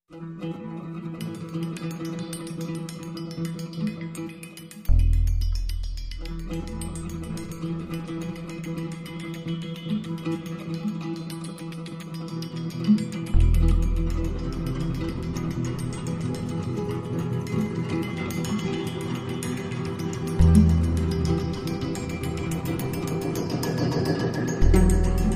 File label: Ticking Guitar